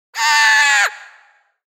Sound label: cartoon creature screaming; epic stock media; Cartoony screaming meme